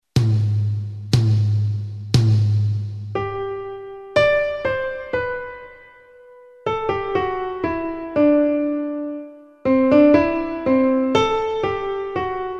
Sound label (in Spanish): dictado; conservatorios profesionales asturias